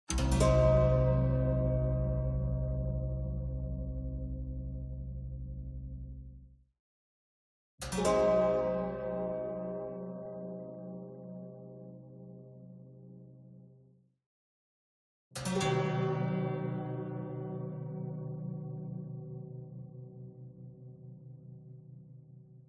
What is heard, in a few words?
HORROR Haunted Strings Chord Diminished; MUSICAL; smartsound